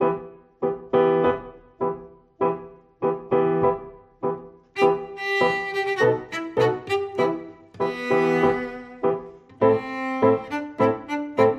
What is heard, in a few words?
tango